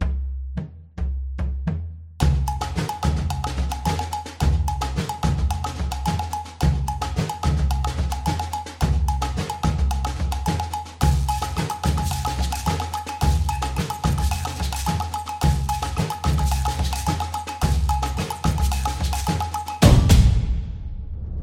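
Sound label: Samba, Football percussion background music inspired by samba rhythm, stadium energy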